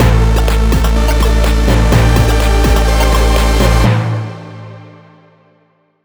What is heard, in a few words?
Game Start